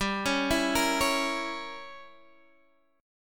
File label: C sharp Diminished 7th G